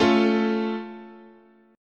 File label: A Augmented, chord